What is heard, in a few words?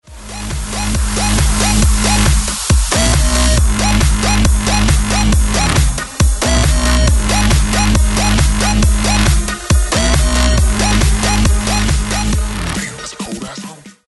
funk rnb hits remixed; multitrax